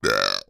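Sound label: AMB China 3D Ambience; gamerip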